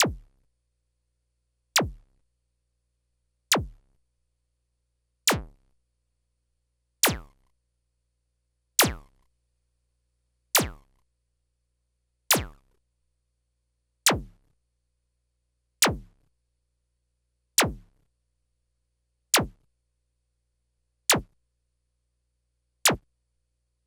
Korg DS-10

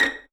vipiz A#5, Violins pizzicato

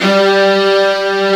G 1, CELLO SECT; AKAI S6000 CD-ROM - Volume 1; Strings

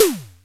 TOM, Simmons SD-8 Drums

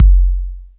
808 K; Roland MV8800; TR-808MKS

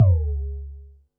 Bassdrum, Pearl SC-40

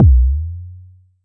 Tempest 808 Style Kick, Drum Machines; 300 Drum Machines; Dave Smith Instruments

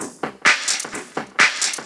FX Loop 128 BPM, House

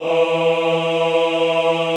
AHH E2, Extended Classical Choir; Best Service

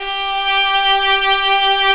viola, 3D Viola Xtreem; E-MU Producer Series Vol. 5 – 3-D Audio Collection; 3DSynths

